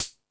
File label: More Cymbals, E-MU Pro-Cussion